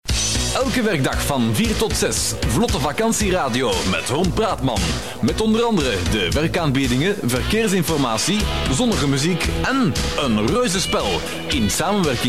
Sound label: jingle; Victoria Halle